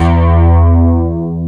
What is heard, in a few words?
SYNCLAVI; East West; Global Sounds